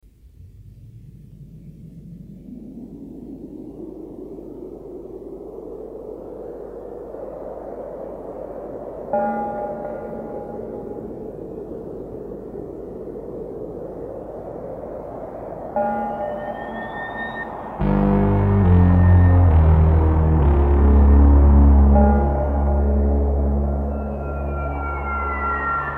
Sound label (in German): Glocken; Dauerblumen